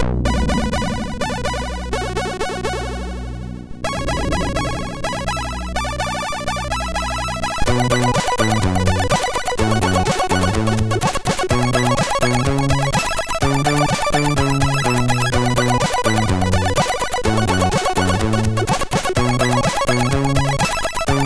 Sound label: Mars Disco